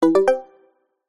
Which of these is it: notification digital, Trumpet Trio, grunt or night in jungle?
notification digital